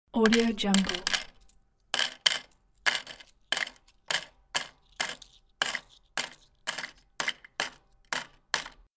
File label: cashier counting coins